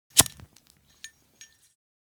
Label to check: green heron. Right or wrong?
Wrong